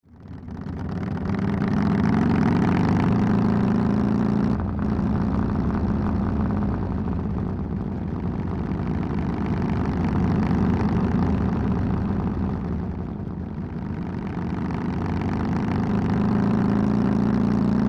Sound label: Motorbike onboard driving engine perspective; vehicle